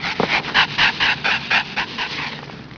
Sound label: alert, monsters devourer; AoMClassic